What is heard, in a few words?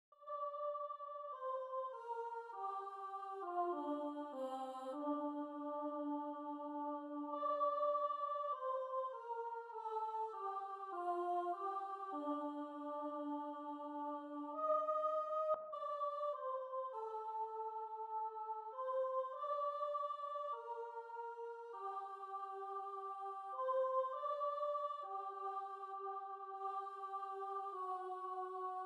choir